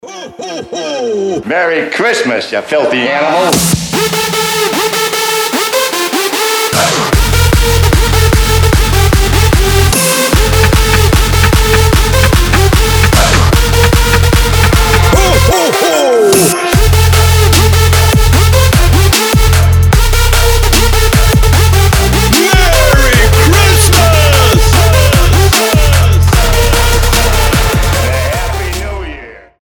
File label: netipicnyj jingle bells